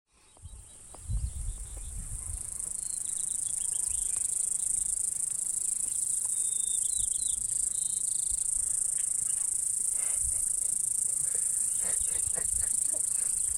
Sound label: Crickets And Birds; Montana 2013